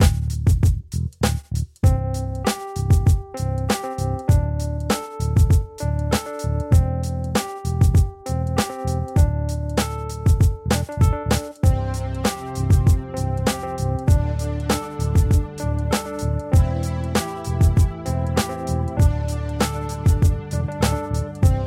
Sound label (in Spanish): INSTRUMENTAL INTERNET MINA; radio3 raportajes